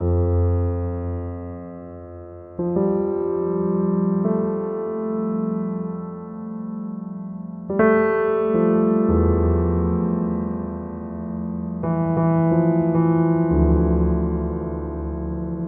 Piano; Sonic Foundry (Sony Creative Software); Slow Silhouette

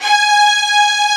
Section String G#4; Proteus 2000; Digital Sound Factory Proteus 2000

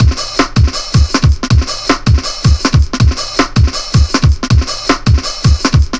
160, Drum Loops; Total Drum&Bass

